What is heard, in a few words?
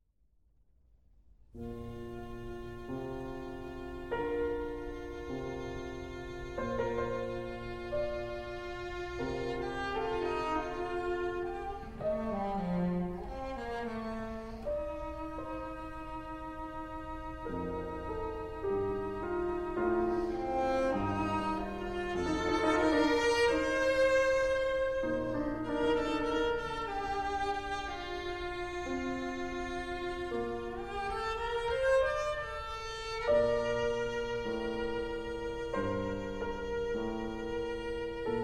Cello Sonata G Minor; Bach